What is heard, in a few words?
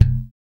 6-STR FUNK, bass; Spectrasonics; Bass Legends